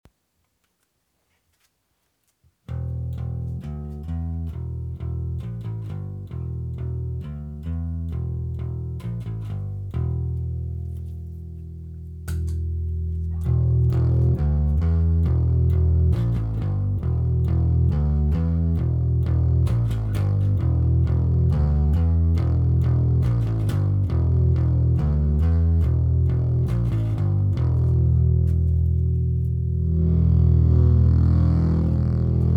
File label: Adamu P Pickup